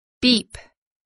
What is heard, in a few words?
beep